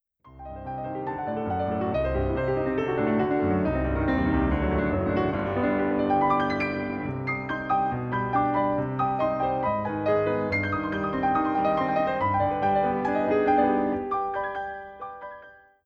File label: The Grand Piano